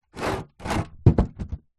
foley plastic water tank small drag ground water inside; zapsplat